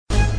mystery short; Criss Cross 81; wazdan

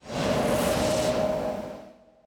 fog; DoubleTigersWD; wazdan

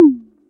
reel stop; Back to 70s; wazdan

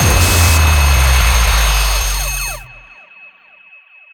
gambling win; BARs 7s; wazdan

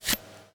minitable out; DinoReels81WD; wazdan

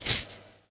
minitable in; DinoReels81WD; wazdan

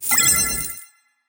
risk out; gambling; Caribbean Beach Poker; wazdan

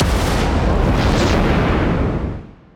draw fire; gamble; DoubleTigersWD; wazdan

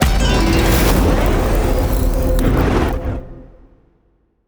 gamble lose; Butterfly Lovers; wazdan

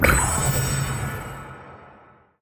gambler round up; DraculasCastleWD; wazdan; gambling

